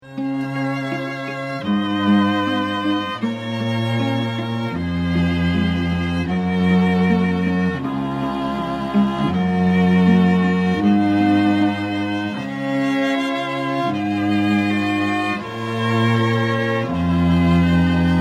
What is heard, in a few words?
Canon, Strings; Pachelbel